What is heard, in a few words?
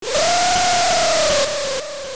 Wind Fish Cry; The Wind Fish crying